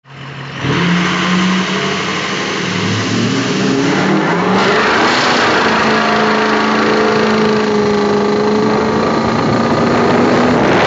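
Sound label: Diesel burnout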